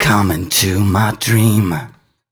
m 128 mel b-flat, Vocals

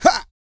GRUNT, MALE HUMAN; Spectrasonics Vocal Planet

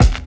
RECORD KICK, HIP-HOP; Drums In Your FACE!

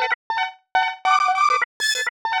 pizzi lead; Polyend; Loops n trouble

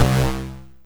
Synth Hit C

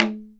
logdrum, percussion

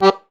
BANDONEON; Best Service; Alpine Volksmusik

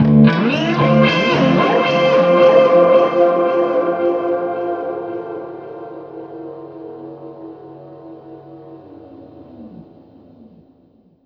heal tones, guitars; Big Fish Audio - Alien Guitars; LOST HEALING

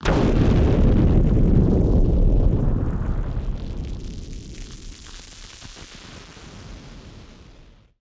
Party Popper FX

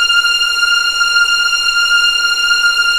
F6 EXP MF, VIOLINS; East West; The Ultimate String Collection

